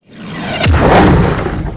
skill shot fire; SkillSound

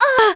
Die

